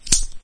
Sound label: scissors small closed; household; arttools; stamps